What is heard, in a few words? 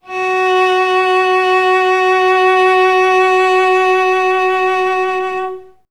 CLS LGP F#3, Advanced Orchestra; Best Service; Peter Siedlaczek's Advanced Orchestra